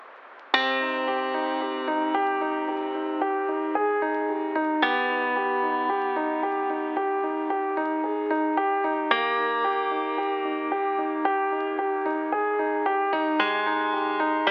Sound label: Melody Dirty